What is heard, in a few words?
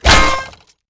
chargebow; hl2 weapon